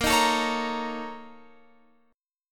A sharp Minor Major 7th Double Flat 5th A sharp Guitar Open F; A#mM7bb5 strummed; x,17,15,16,16,17